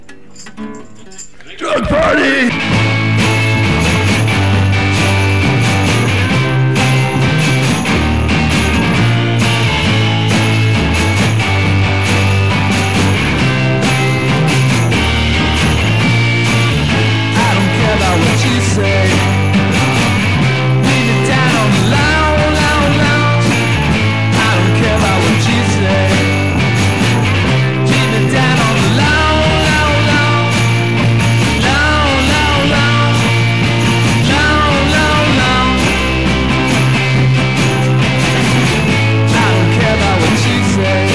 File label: Bells With Tremolo And Distortion